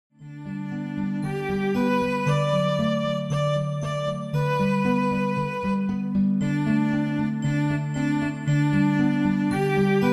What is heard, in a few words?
sonatina in g; baby einstein; disney